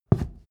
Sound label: step wood step